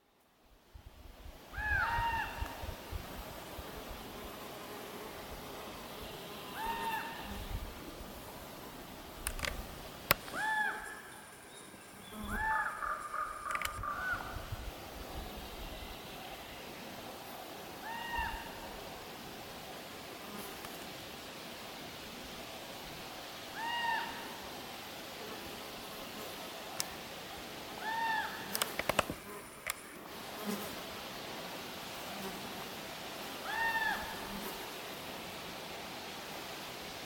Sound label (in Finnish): korpit, linnut